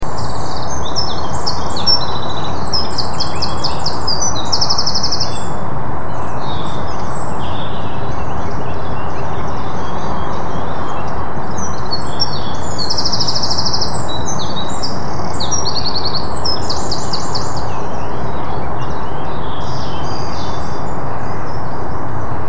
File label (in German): zaunkönig; Mag. Peter Holub